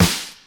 bright acoustic snare sound f key, steel snare drum sample tuned to the F note; snare drum samples